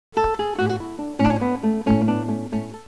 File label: guitar